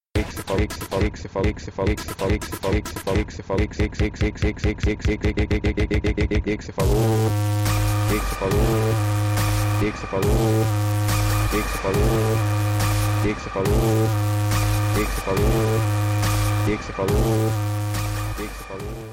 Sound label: hahahaha